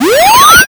charge

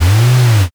servo

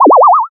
teleport

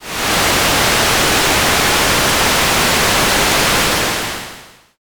rain

